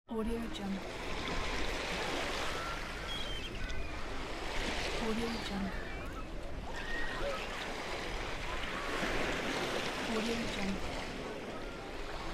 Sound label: beach ambience; n-audio